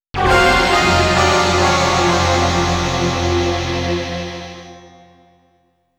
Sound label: attack over; Clock Tower 20th Anniversary Sound Collection